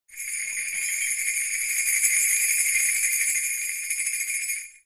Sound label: bell